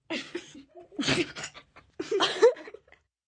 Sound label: Group Laugh Cough, Laugh, Group, 2 children, giggle, under breath, quiet, kids; males, females, girls, boys, human voice; VoiceBox